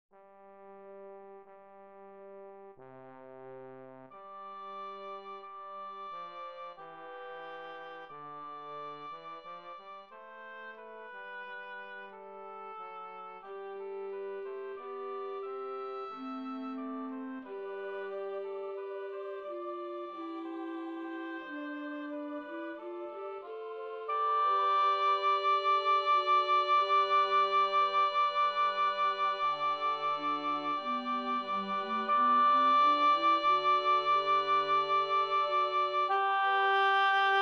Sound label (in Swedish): Christe ur Kyrie tenor